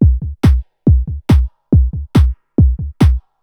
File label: hifi, Techno Drums; TechnoMusicMaker